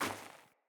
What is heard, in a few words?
Sandals Walk Snow, footsteps; Player